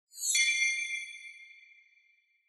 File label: Bell Accent, Dry